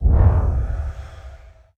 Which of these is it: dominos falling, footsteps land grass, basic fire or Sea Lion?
basic fire